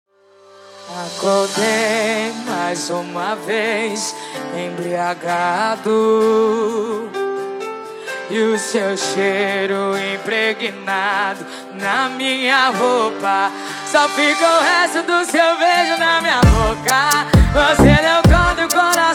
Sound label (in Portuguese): especial sertanejo